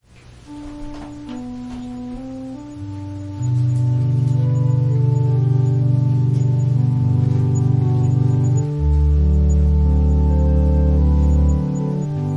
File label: Organ prelude; A warriors strength